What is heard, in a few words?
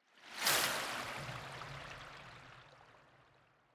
whoosh long